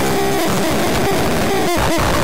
screaming demon

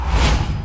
news transition whoosh

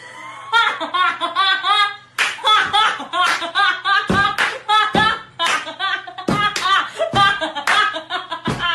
hahaahahahahaah

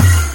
enderman teleport sound; minecraft

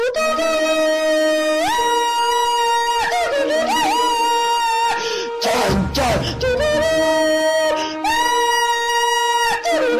universal funny theme

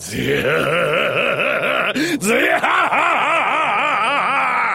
zehahaha laugh; edward teach

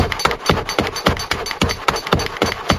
upgrade sound; clash of clans

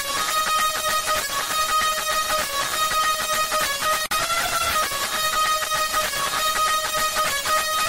indian music loud like me hahahahahahahah

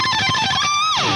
electric guitar shrednanigans

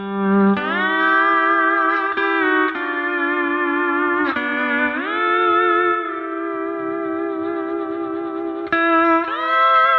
sad song; spongebob

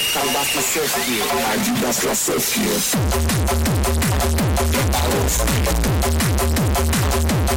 russian hardbass loud

